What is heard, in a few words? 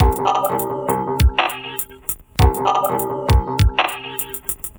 Saz Beat; Sonic Foundry (Sony Creative Software); Myths of Technology; Composite Beats